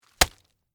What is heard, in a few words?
Impact Cabbage Head, Drop, On, Cabbage, Leaf, Pile; Texture; Vegetable; Drop Sound Effects